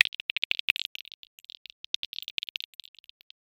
tiny humanoid bones click; game ui

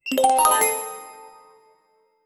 magical level up, fantasy

